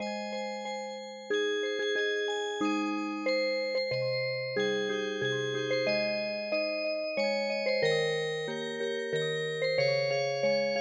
Music Box, traditional

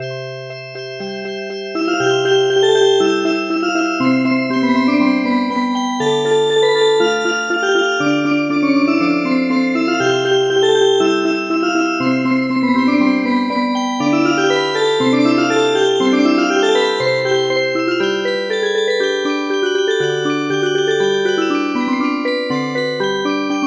Schottish Music Box; midi; traditional

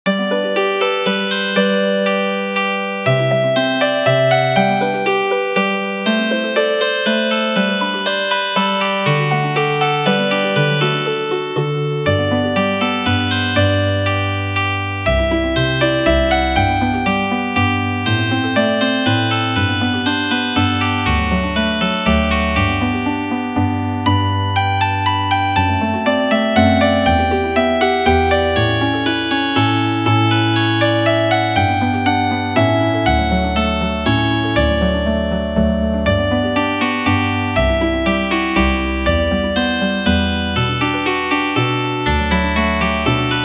minuet Healing Harp

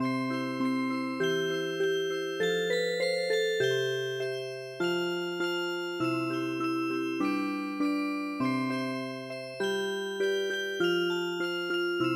Music Box

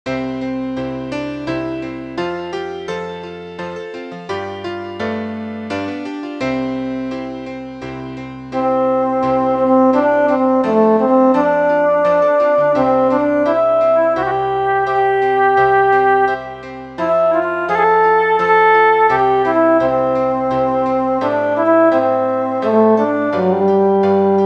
Clock Tower Bell

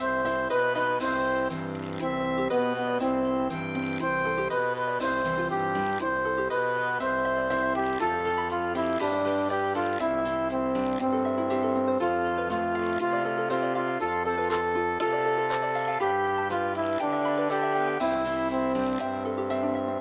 nursery rhyme

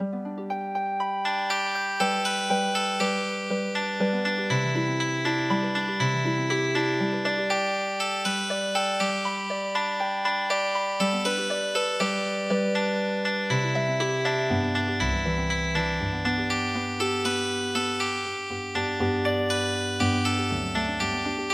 Healing Harp, traditional; The Granziers Daughter

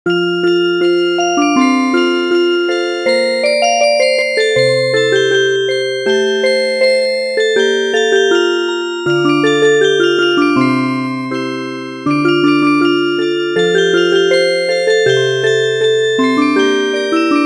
Music Box, midi traditional

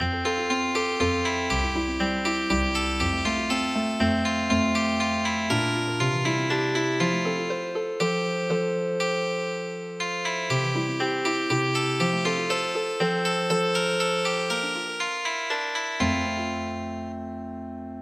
Healing Harp, traditional

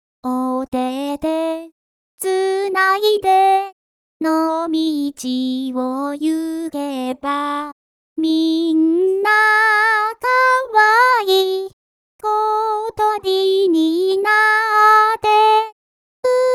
vocal only

